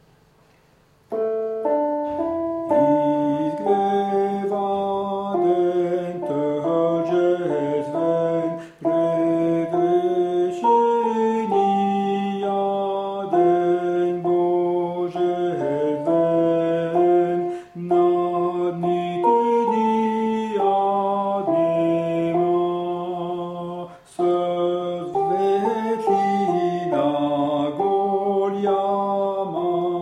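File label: tenor, chants; SATB; Izgreva den tarjestven; Peter Deunov; concert du 15 août 2009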